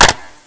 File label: LANDING, weapons